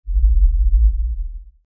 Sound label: LFE Tremor, Lfe Tremor Low Bass Shake Rumble; Rumbles; Blastwave; Sound Effects:Sound Design